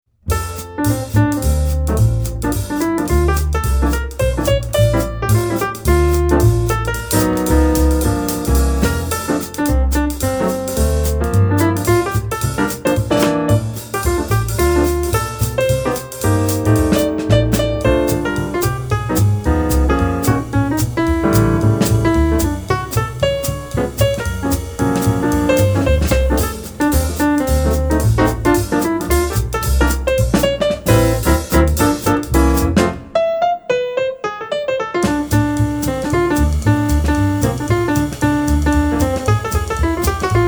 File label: Jazz Trio; London